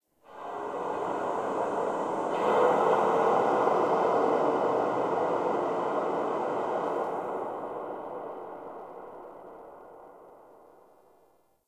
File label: Hub Texture O, Ambience, Dungeons, Under City; Silent Rose